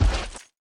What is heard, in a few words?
Mutantile move; SwampOfDarkness